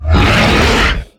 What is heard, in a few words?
Exertion Heavy, Behemoth; Evolve